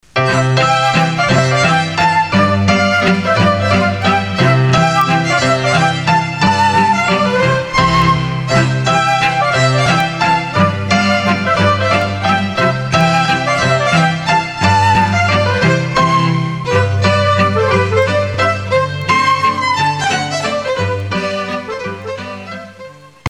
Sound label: Mazurka